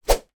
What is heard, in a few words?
sport badminton racket fast movement swoosh; sound effects one